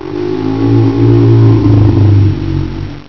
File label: nightmare, ambience; mod quoth